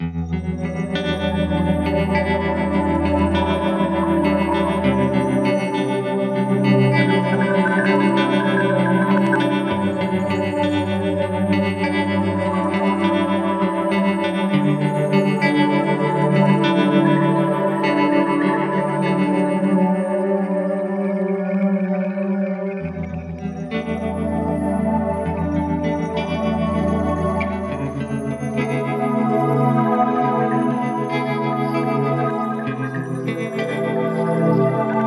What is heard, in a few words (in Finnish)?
kitarasyna; guitarsynth